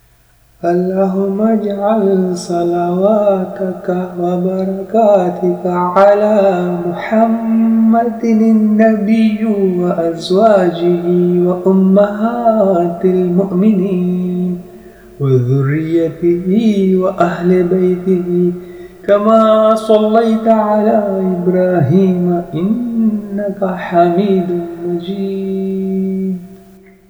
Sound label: durood